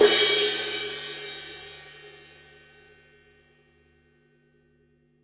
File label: brass 20 ride edge